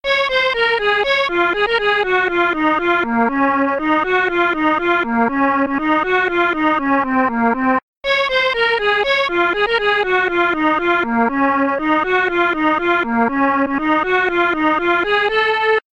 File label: Instrument Violin